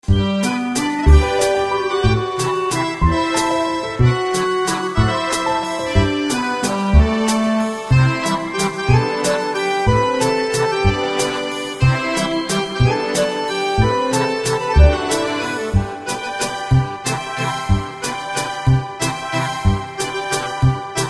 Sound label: Shop Music